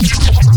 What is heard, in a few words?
custom bass